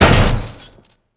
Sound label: Door Metal Small Open; Max Payne